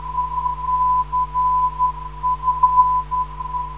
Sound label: 345.5kHz, ndb